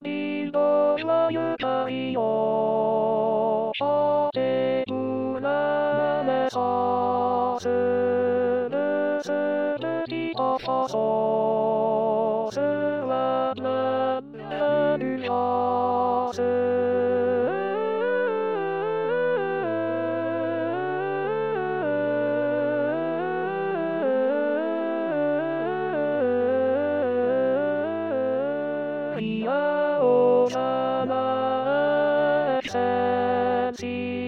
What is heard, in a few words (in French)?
Alto; Ding dong